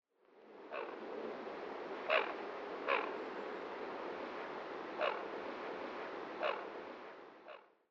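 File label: Common Goldeneye; hojirogamo; tomakomai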